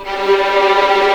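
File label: G3 tremolo f violin right, violins tremolo; East West; The Ultimate String Collection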